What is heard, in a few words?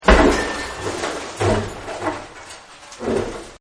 Ring The Bell, Debris; Materials